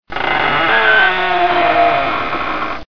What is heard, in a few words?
halloween Door Creak